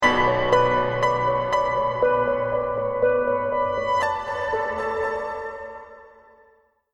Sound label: lost round, music